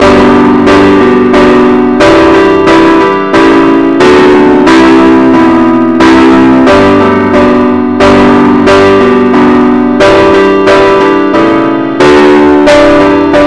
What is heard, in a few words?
amazing grace all voices